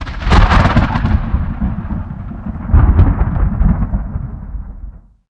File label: thunder; minecraft; ambient; weather